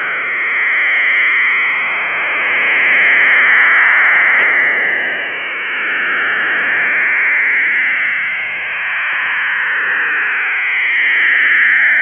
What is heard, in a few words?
noise